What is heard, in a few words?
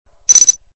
mine beep